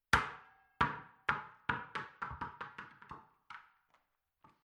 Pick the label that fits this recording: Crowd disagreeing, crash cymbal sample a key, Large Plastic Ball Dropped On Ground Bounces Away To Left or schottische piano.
Large Plastic Ball Dropped On Ground Bounces Away To Left